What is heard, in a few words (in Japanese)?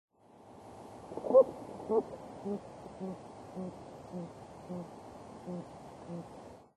okonoha; nikko